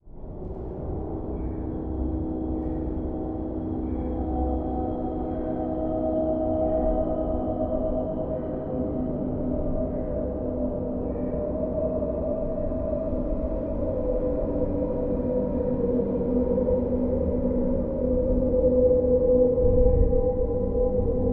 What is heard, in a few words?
Moaning Drone Constant, evolving